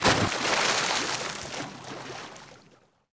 splash large Object; water splashes

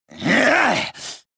combat; reborn

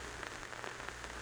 radio static; Soldier Of Fortune II Double Helix; XBOX; ambience

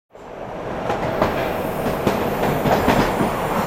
train interior; Marc Ecko's Getting Up: Contents Under Pressure; XBOX

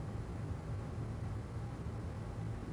room interior; Soldier Of Fortune II Double Helix; XBOX; ambience

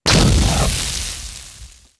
explode

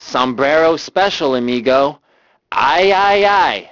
band; Grand Theft Auto Vice City; XBOX

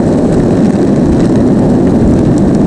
roll asphalt, terrains; Tony Hawk's Project 8; XBOX

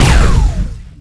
Plasma Shoot, Plasma Rifle

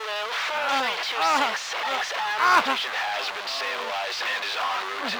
hurt guy

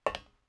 metal walk, footsteps

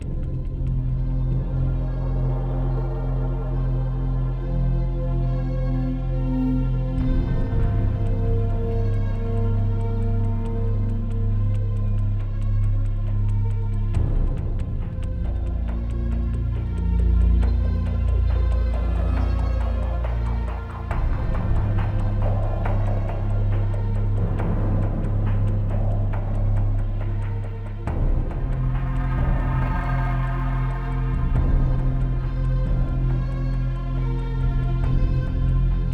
Menu Quiet Light; Tom Clancys Ghost Recon Advanced Warfighter; XBOX

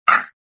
land; Star Wars Jedi Knight II Jedi Outcast; XBOX; shadow

